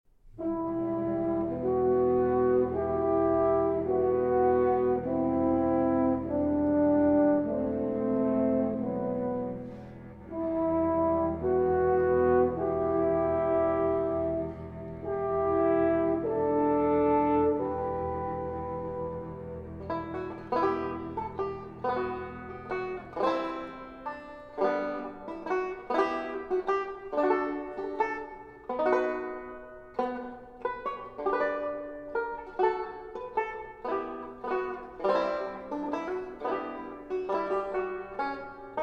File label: concerto banjo